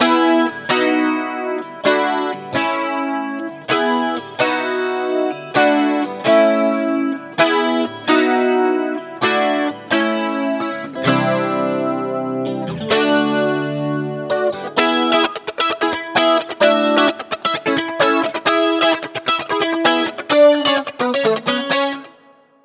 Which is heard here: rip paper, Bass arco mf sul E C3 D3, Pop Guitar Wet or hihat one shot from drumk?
Pop Guitar Wet